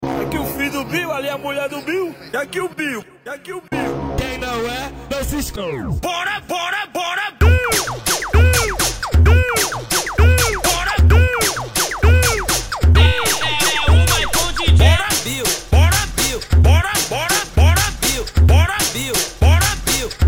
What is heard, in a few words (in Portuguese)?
funk remix